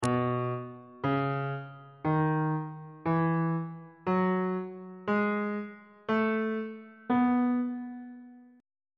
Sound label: c flat mixolydian mode